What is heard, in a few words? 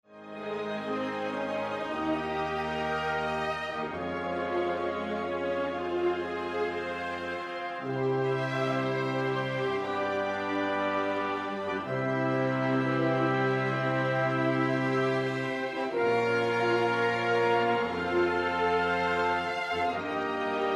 Chorale; Honeck